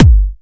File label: BD Punchy; Vengeance Samples; Bassdrums